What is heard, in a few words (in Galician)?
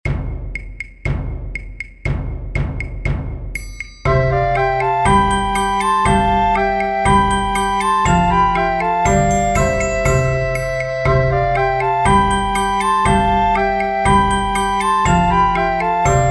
Conxunto Instrumental